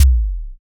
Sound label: kick